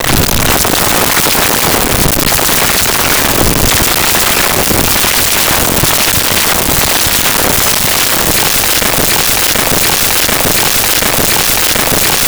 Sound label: heartbeat speeding up